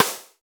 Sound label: Brush Roll, snares